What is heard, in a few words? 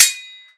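sword distant ringing close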